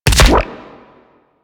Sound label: Weapon Punch Shotgun